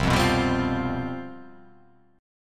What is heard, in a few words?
C Major 7th Suspended 4th